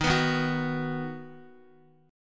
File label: D sharp Minor Sharp 5th D sharp down Guitar; strummed; D#m#5; JGuitar